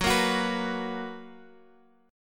F sharp Major Add 11th; F#add11 strummed

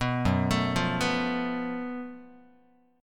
F sharp Minor Major 7th Double Flat 5th, Guitar; arpeggiated

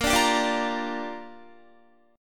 Bb 6th, Guitar; strummed; 18,17,17,19,17,17

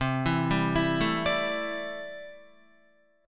C 6th Add 9th C down Guitar; arpeggiated; JGuitar

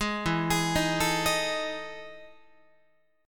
E Major 11th E, Guitar; EM11 arpeggiated; 11,12,11,13,13,14; JGuitar

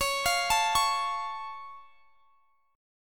Db Augmented Db down; arpeggiated

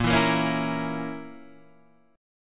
A sharp Diminished A sharp, Guitar Open D

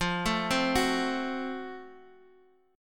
Gb Minor Major 7th Flat 5th, chord

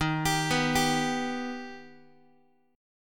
Eb Augmented Eb, Guitar; Eb+ arpeggiated; x,11,10,9,11,x; JGuitar

